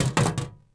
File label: blaster hit metal; objects; Star Wars Obi-Wan; XBOX